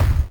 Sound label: Kick, Genesis; Street Fighter 2 Turbo; M8